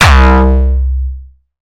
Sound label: Hardstyle Kick